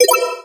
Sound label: level complete